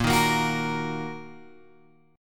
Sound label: Bb Suspended 4th Sharp 5th, Bb, down, Guitar, Open G